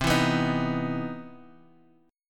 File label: C Major 7th Sharp 9th C, chord